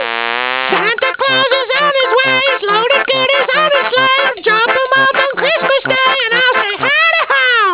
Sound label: singing; hankey